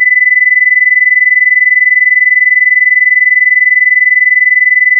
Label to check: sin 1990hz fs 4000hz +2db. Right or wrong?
Right